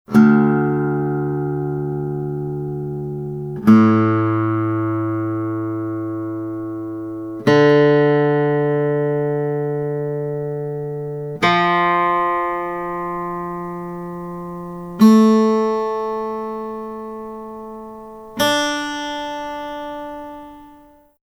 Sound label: DADFAD tuning notes